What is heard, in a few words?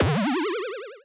bounce spring jump